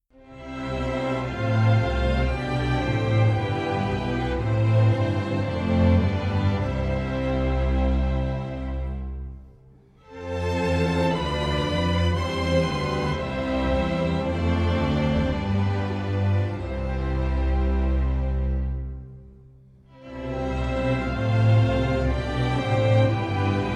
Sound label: Chorale, String Orchestra